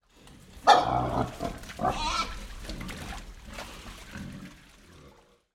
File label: animals pigs grunt inside barn wet sloppy mud